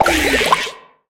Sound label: death; Doppelganger